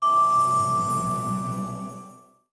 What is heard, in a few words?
level up caster